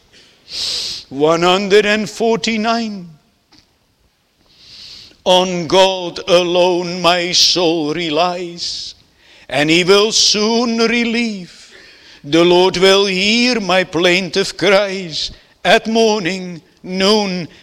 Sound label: Singing